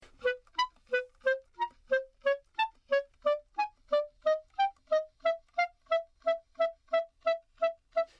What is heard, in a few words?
clarinet